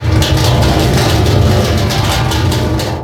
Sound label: Shipyards Barrel, Ambience, Props; Warframe; Grineer Shipyards